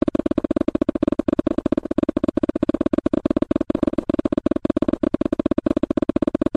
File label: flying; toonbook